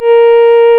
REED A#; Big Fish Audio; Synclav FM

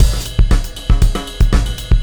Beat, Drums, Eclectic Electronica

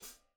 Soft Slow Foot, Hats; Airwindows

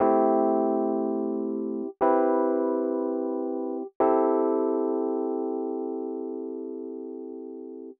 120 Rhodes G, Keys, Nu Jazz House; PowerFX

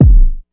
Kick, Ensoniq ASR-X Pro

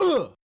"Uuuuugh", Yamaha DJX 2B

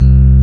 Dyno EP Medium C1; Proteus 2000; Digital Sound Factory Proteus 2000

